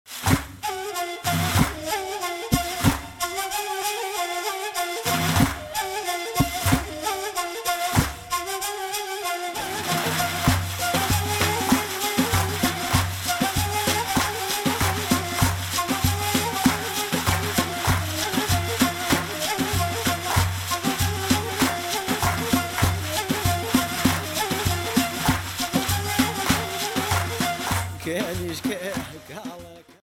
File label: Daf with Ney